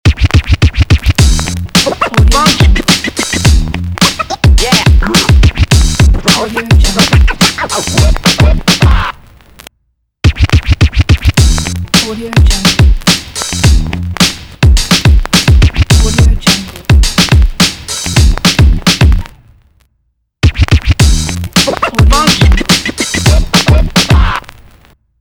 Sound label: scratch hip hop logo